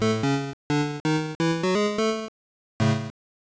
Level Clear, Game Completed; Garfield Caught in the Act; Game Gear

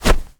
Weapon Crash Robe

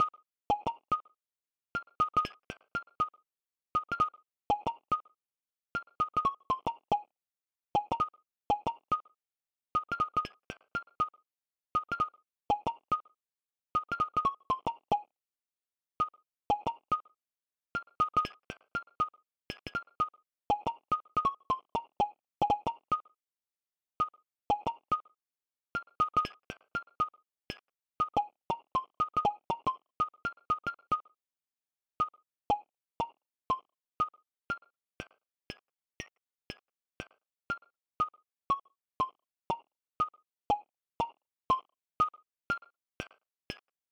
melody; game builder garage 2021 switch

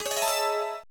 Coin Inserted; desert tank; model 2; arcade

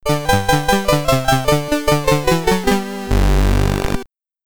Game Over SID Stereo; Jack the Nipper; Commodore 64

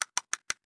Scrolling Prop Slow; Lego My Style Kindergarden macOS Windows 2000

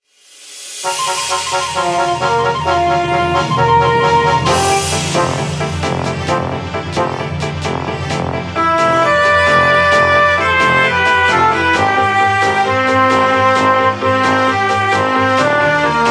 Bgm Fantasy; rpg maker mv

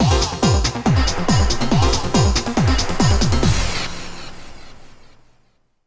Stinger; Miner 49er Level 3

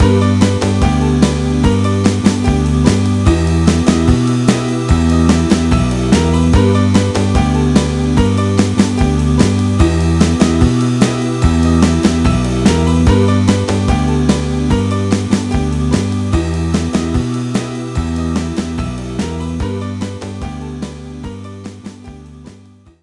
CHILL; thrillville off the rails

